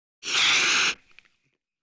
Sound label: wildcat hiss